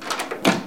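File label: summer porta open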